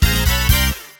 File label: Music Minigame win; London Racer Police Madness; PS2